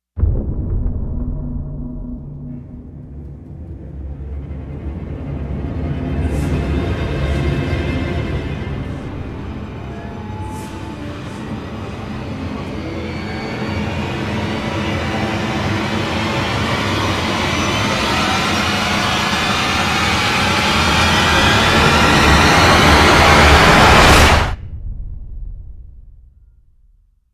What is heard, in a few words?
Rise; Ninja Tracks